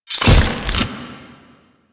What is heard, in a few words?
Machete Finisher Stab; Sentient; Warframe; Quests; The New War; Kahl; Weapons